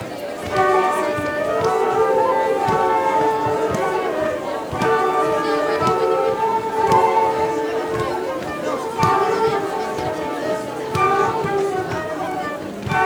bal loudia flutes; festival; riguedaod trad

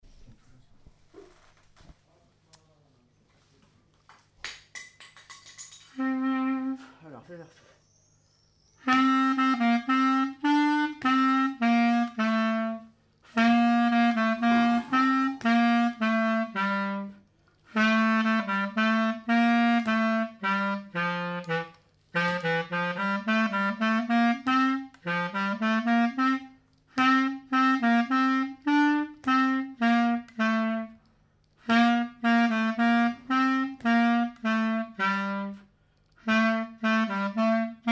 grec lent, clarinette; stg clarinette

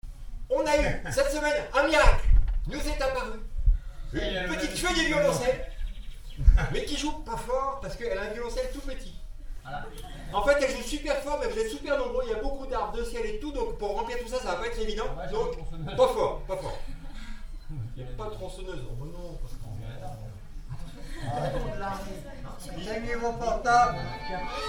hanter dro violons; charivari

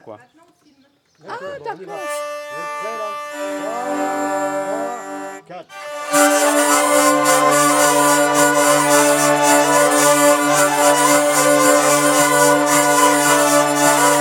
vielles; festival

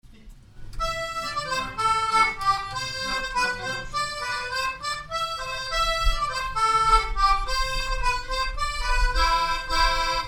polka accordeon; charivari

